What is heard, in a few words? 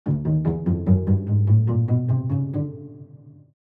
Cello pizzicato scale